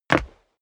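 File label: step dress boots wood parquet jump, footsteps; schritte; menschen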